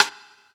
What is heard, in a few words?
stick